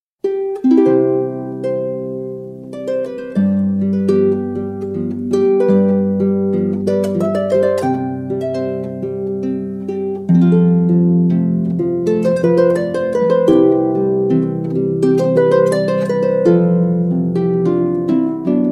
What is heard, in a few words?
Platt Menuett, tiroler harfe